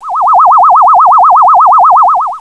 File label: medium phasor